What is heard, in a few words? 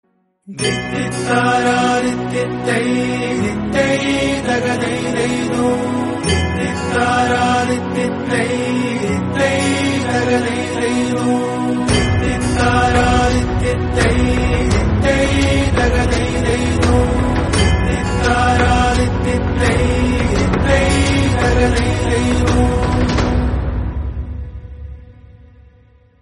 solo traditional ringtone; Indian